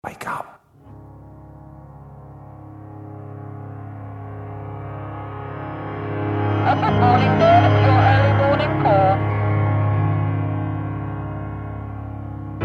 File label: witch